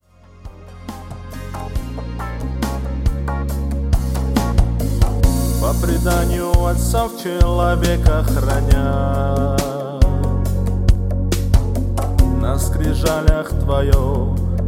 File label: gospel